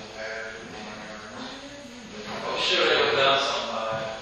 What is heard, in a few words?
Humming; Oakley plantation